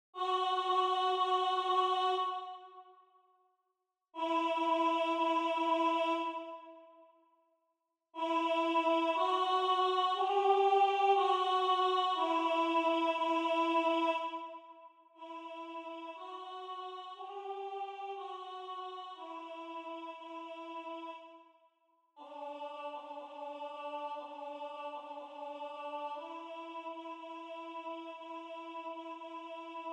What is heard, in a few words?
Alto; Antonio Vivaldi; Gloria RV589